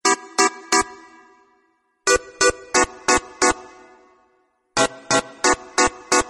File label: Horn Sound Song; toque de celular